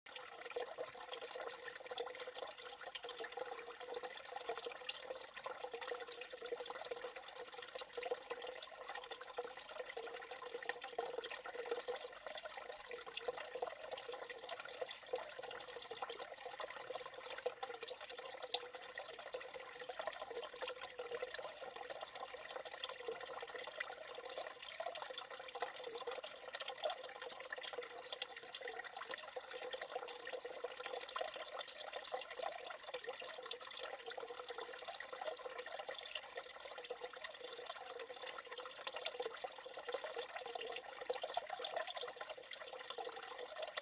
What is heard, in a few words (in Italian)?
acqua che scorre, forest park; ards forest park